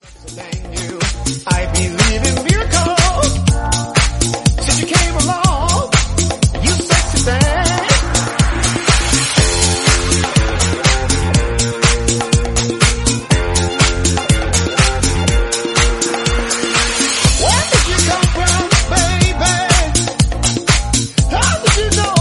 122bpm Bb

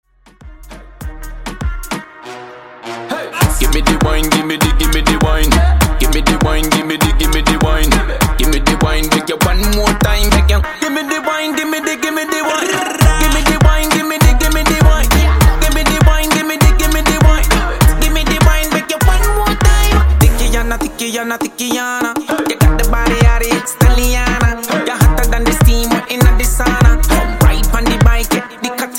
Dj Intro Outro 100Bpm

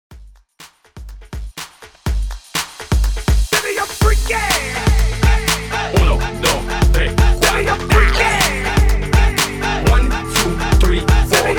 HYPE REDRUM, 123 BPM

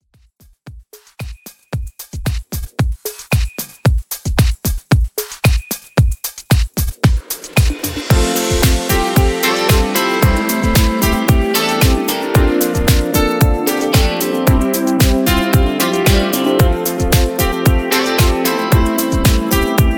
Club Classic Mix 113Bpm Clean

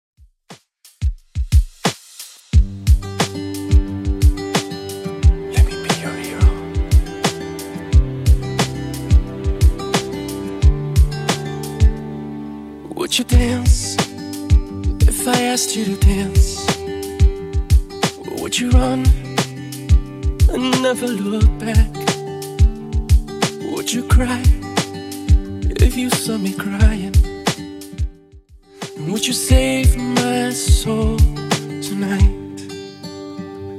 2ks Redrum 89bpm

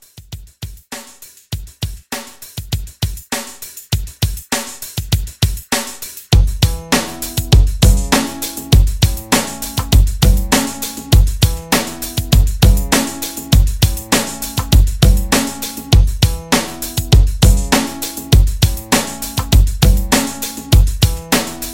Pop Rock 100

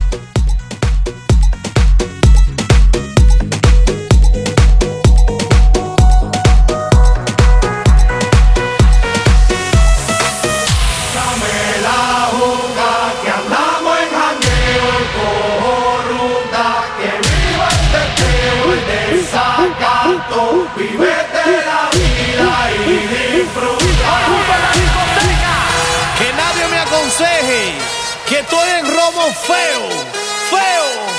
AFRO TRIBAL REDRUM

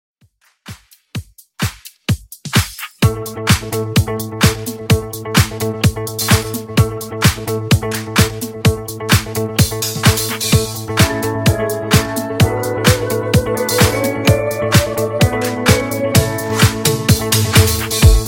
Funk Nudisco 128Bpm

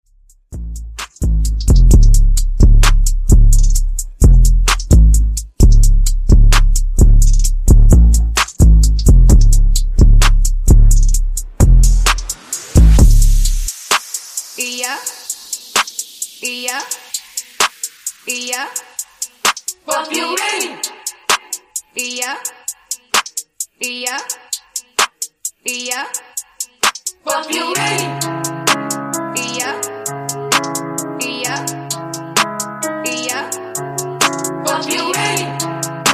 Top40 Mixshow Intro 65 Bpm

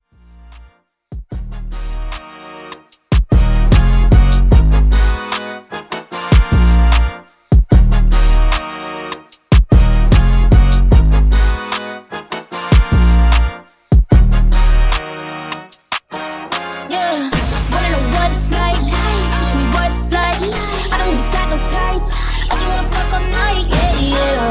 Top40 Mashup

